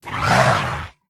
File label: sound Dragon Boss Roar